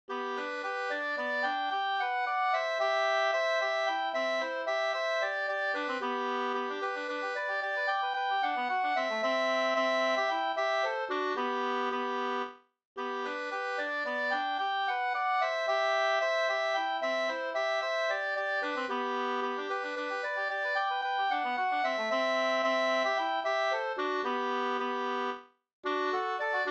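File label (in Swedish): slangpolska, two-part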